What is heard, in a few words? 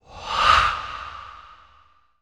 Reverse Surreal Breaths, Horror; Sound Design